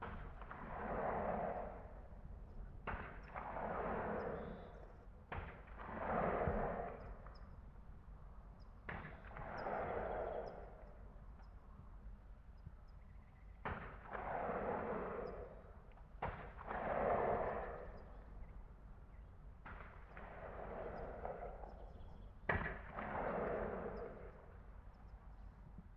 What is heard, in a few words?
ambience gunfire